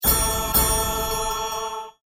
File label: reward Carrot; inlogic-bunnyquest